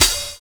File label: FAT DISCO; Univers Sons